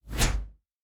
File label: Synth Whoosh